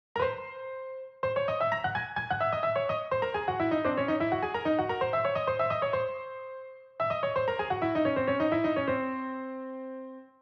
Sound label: Piano Solo; Final boss